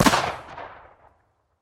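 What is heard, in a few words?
weapon sounds; zvuki oruzhija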